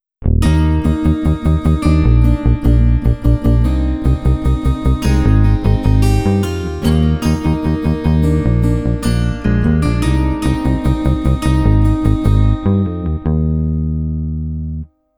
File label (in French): Basse Guitare